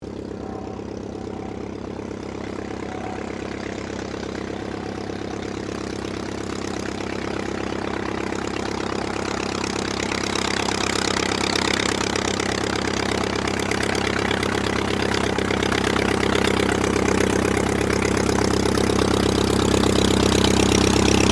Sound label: AIRCRAFT AUSTER III TAXI; Airplanes; Vehicles; Airplane Sound Effects